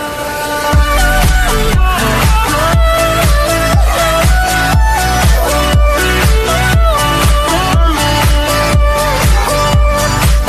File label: ringtone; i phone x plus